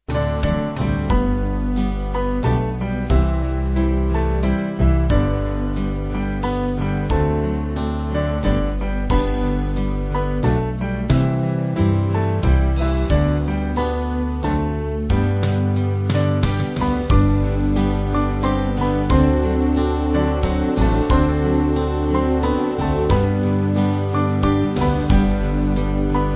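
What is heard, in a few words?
Band, Music Backing Files for Churches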